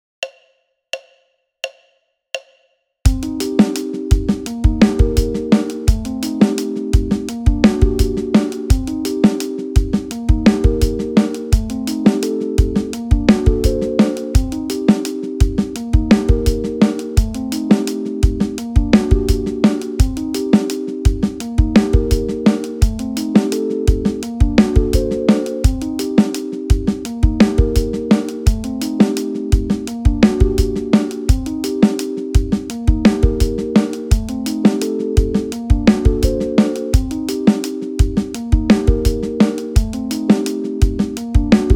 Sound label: Piano